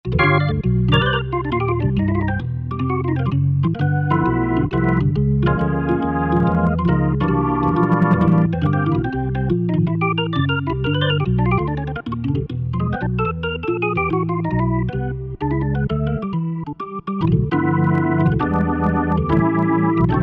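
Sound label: Percussion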